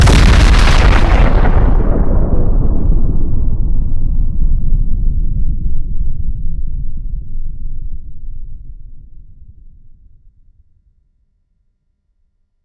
Nuclear explosion; Hovl Studio; SFX Battle sounds; WhiteMan Unity2D

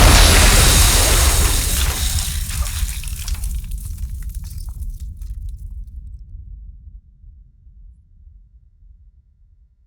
Explosion hit glass; Hovl Studio; SFX Battle sounds; WhiteMan Unity2D

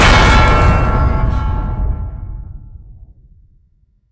Explosion hit metal; Hovl Studio; SFX Battle sounds; WhiteMan Unity2D

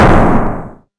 Small explosion; Hovl Studio; SFX Battle sounds; WhiteMan Unity2D

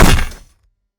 Bullet hit stone; Hovl Studio; SFX Battle sounds; WhiteMan Unity2D

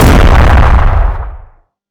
Medium explosion; Hovl Studio; SFX Battle sounds; WhiteMan Unity2D

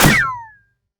Bullet hit metal; Hovl Studio; SFX Battle sounds; WhiteMan Unity2D

